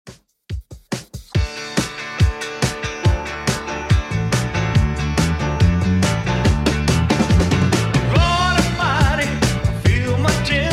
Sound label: 70s Redrum 141bpm